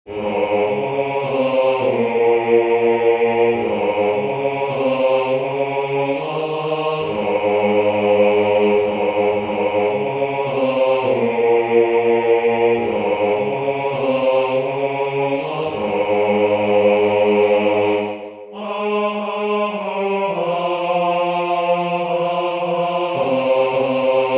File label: basse; oi suomi katso